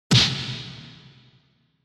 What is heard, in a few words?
Hit Punch, Reverb; 打撃 パンチ; リバーブ